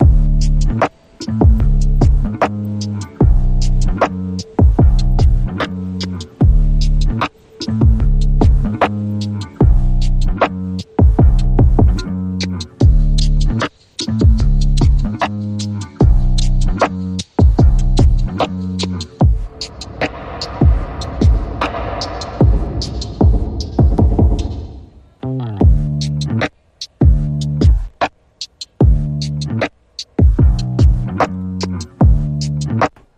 MPC Guitar, Rhythm; 60sec

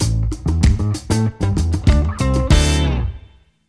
Funk

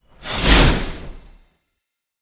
Hit Bolt Fierce Slugger

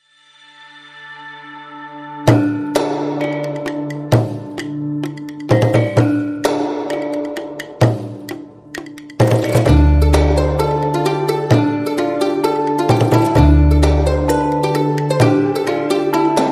No Woodwinds

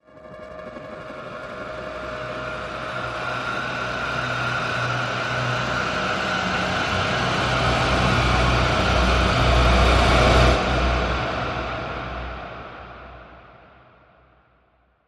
Rise Long

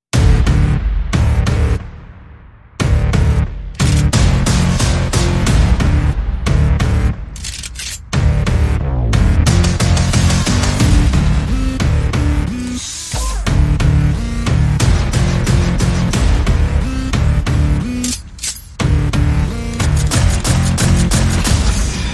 No Guitars

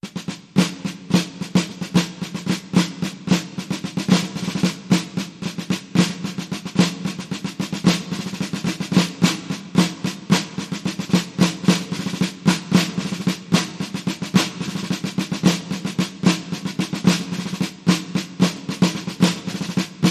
Dixie Solo Snare Drum

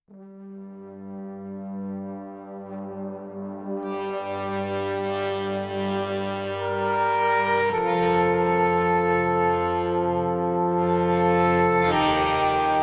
Bavarian Flamenco, Stimmhorn Version

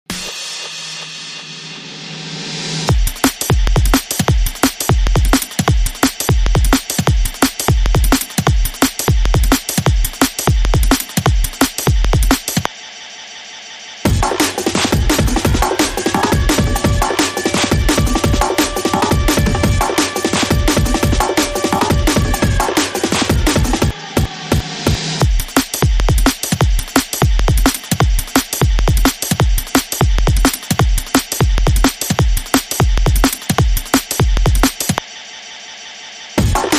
Fast as You Can Beat

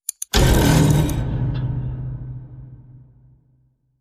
Power Drill

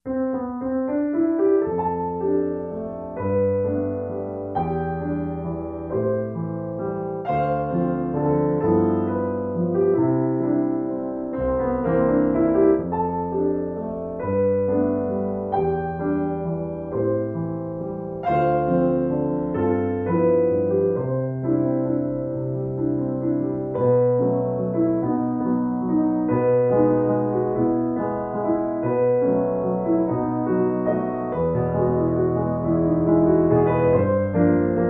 Solo Piano

